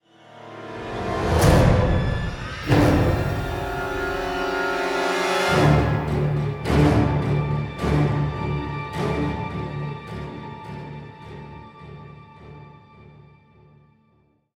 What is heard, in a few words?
Stinger; the walking dead survival instinct gamerip; Jess